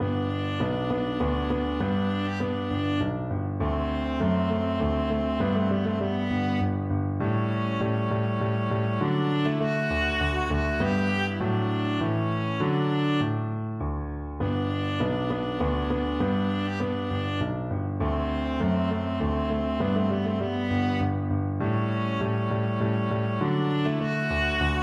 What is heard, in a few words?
minuet, viola